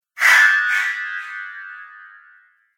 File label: Chime Whoosh; sound design; Whoosh Sound Effects